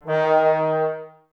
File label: BRS TBS D#, Woodwind Brass Ensemble, Brass; Miroslav Vitous - Woodwind Brass Ensemble